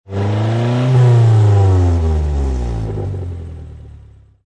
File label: Car Motor Engine Rev Engine Perspective; Car Sound Effects; Cars (Sound Effects:Vehicles)